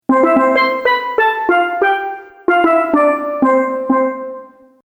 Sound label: Jingle Steel Drums